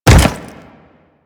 Step Default; Leto

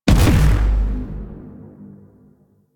Railjack EMP Stun Ability; Warframe; DE; Vehicles; RailJackPowers

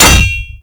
Shield Impact; Warframe; Grineer

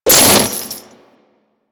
Condemn Chains Beam; Warframe; Warframes; Priest

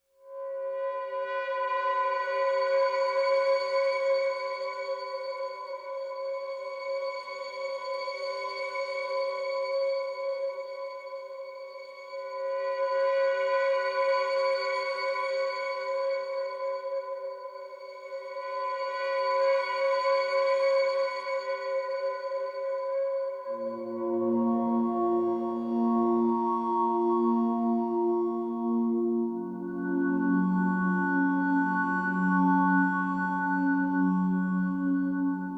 Plains Day One Amb Synths; Warframe; DE; Dynamic Music; Eidolon Plains

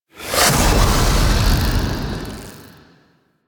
Ground Slam A; Warframe; The New War; Archon; Nira; Abilities

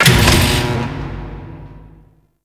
Fortress Large Door Open; Ambience; Warframe; Grineer Fortress

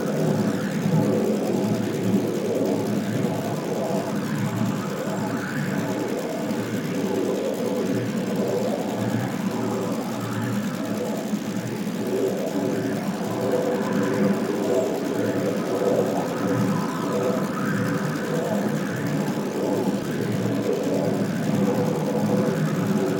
Portal Loop; Missions; Zariman; Zariman Exterminate

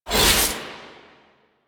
Whoosh, Warfan; Warframe; Tenno; Broken Frame; Quassus Prime

